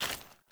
Foley Action Armour Run; Player; Mother Armour

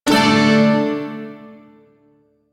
Chromatic Chord C; Shawzin; Warframe; Duviri Shawzin

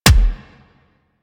Weapon Punch Rifle

